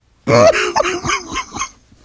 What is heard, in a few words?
laughter, survivor voice